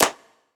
Short Reverb Clap Sound Clip A Key, Royality free clap sound clip tuned to the A note